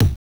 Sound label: Kick, Genesis; Sparkster